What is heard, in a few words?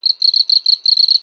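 Pets Insect Large Happy; creatures; Lego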